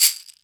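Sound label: caxixi, drum machines; 300 Drum Machines; E-MU Orbit-3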